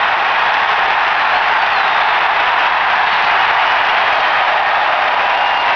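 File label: applause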